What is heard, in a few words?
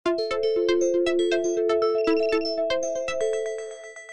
alarm windows 10